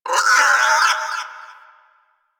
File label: Creature; Warframe; Quests; TheNewWar; Ambience; Drifter Camp; Creatures